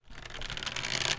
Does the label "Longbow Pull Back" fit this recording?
Yes